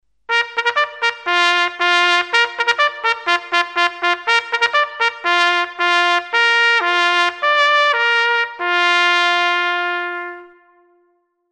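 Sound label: signals, bugle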